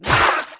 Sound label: Shark hit, game; Eft